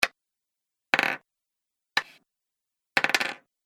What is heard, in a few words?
Game Piece, hit; Game Piece Soundpack; sound design